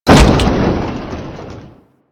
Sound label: Fall, Foley, Impacts; Warframe; Grineer